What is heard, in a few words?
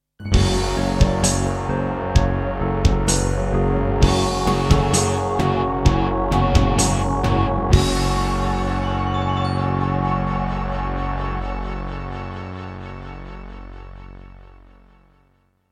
Introduction, Akai SG01k; Doom (Sigil)